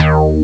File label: basse; Amiga Soundtracker Sample Packs